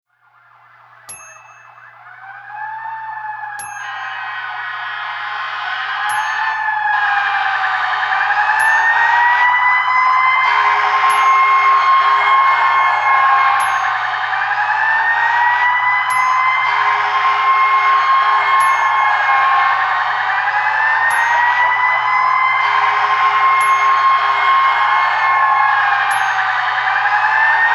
emergency scene

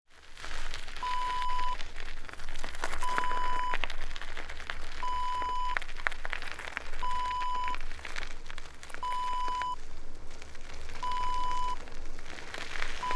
bicycle ride

